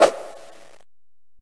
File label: throw; Viking Escape